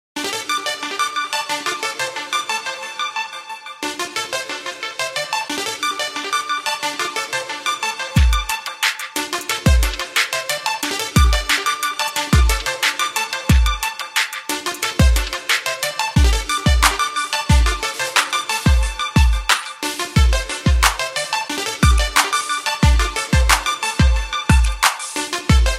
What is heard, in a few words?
iphone, ringtone; apple